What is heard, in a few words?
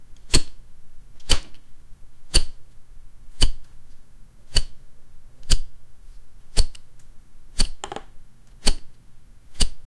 lifting a heavy object sl, physical